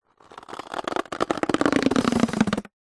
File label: foley balloon inflate short single breath expand creak; zapsplat